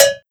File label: Bell Cow Bell